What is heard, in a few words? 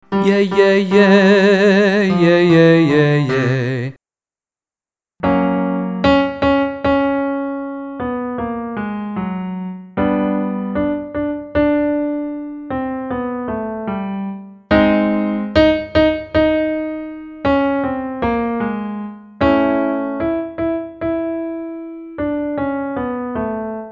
Yeah Yeah Yeah on 5 tone scale female